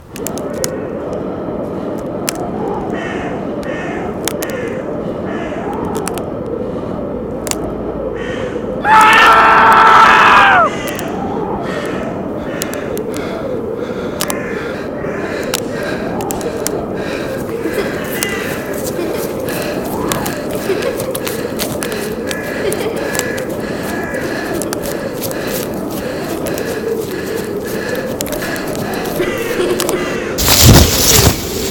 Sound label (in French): paysage sonore; emilie piquet